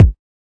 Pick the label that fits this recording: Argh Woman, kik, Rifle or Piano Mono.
kik